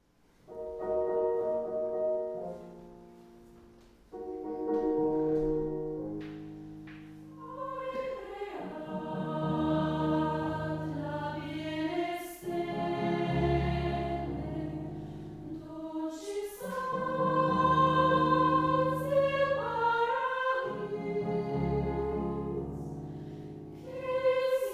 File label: female Choir; Ai Preat